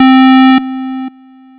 M-Audio Venom Raw Square C4

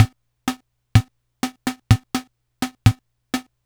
Yamaha PSS 140 Tango

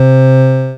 Casio MT-45 Pipe Organ C3

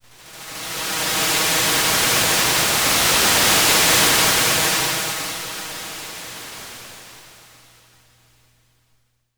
Yamaha PSS-280 Wave

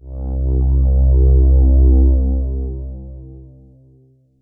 Roland JX-8P Lo Strings C4

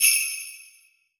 Korg N1R Jingle Bell

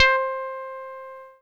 Casio MT 600 Koto C5

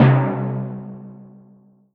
Timpani C4

